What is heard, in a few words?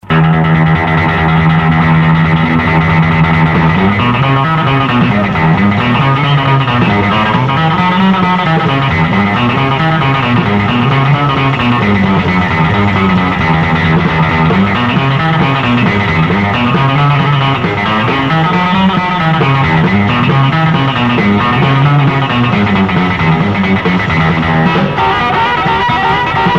Guitar boogie